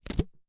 Household Tape Scotch Tape Stuck Together And Pulled Apart